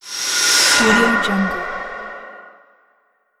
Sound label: last gasp, human sounds; آخرین نفس